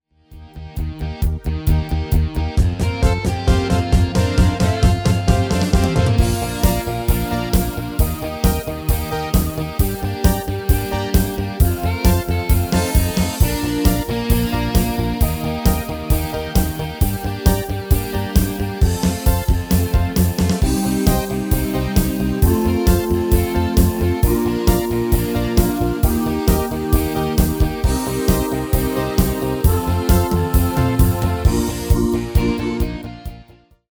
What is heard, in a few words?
MIDI